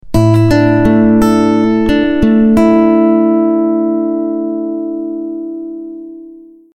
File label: shut audio; Tunisie Telecom